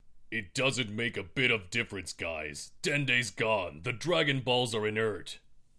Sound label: piccolo impression